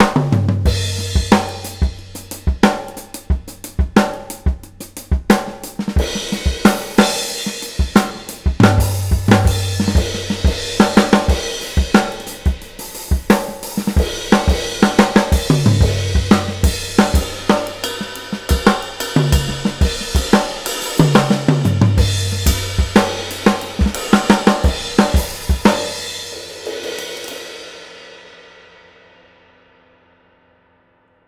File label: JAZZY 90 GROOVE, DRUMS LOOPS; BASEMENT DRUMS VOL 2